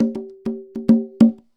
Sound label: Conga Loop (100BPM); musicradar